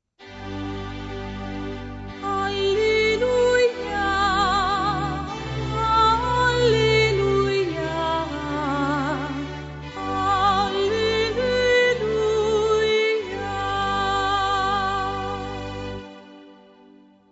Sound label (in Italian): Alleluia Gregoriano; matrimoni